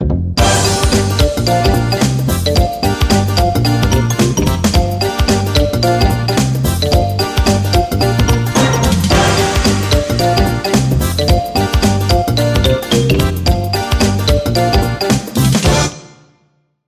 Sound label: old rap, instrumental